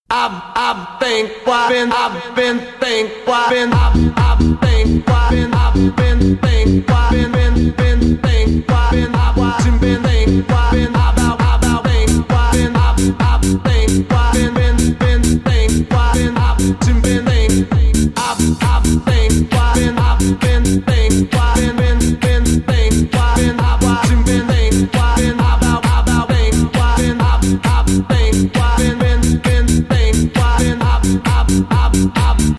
club music; taunts; props

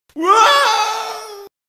distant fall woah; taunts; props

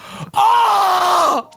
agony; taunts; hunters

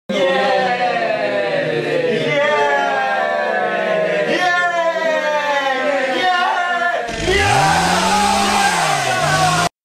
yaaaay yaaaaaayy; taunts; props

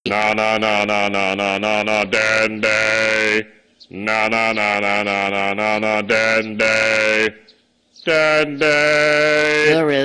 song; taunts; hunters

